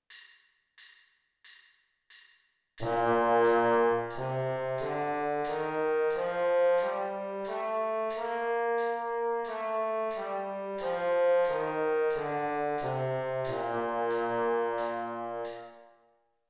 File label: b flat major scale trombone baritone